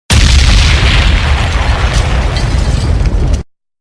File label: Single Explode; DJ Effect